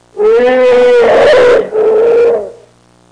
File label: Elephant trumpet sound